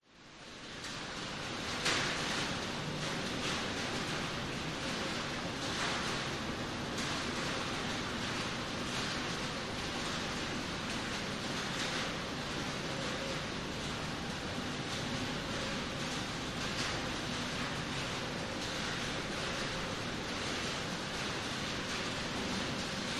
industrial warehouse rain roof thunder rumble noise of workers outside yard; zapsplat